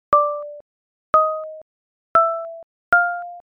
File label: cave drip